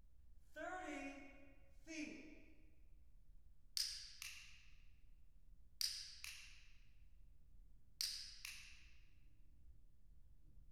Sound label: Depth of Image, Acoustic Clicker: 30 Feet